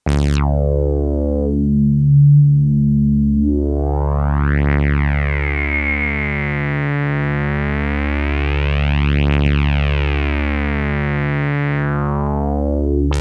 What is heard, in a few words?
4pole Filter